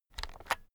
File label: keyboard external shortcut short